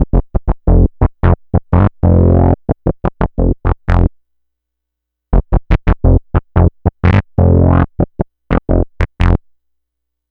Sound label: Synth loop 90 F, Synth Bass Loops; Slate Digital BASSHOLE Sample Pack